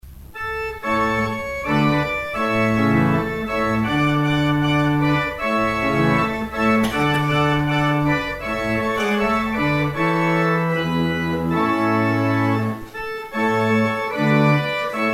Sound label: organ outro; exit music; St. Mungo's